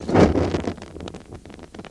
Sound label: Flame Burst Fade